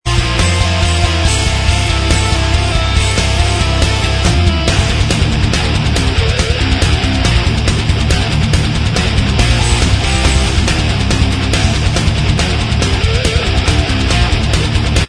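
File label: Instrumental Track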